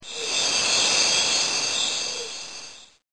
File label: insects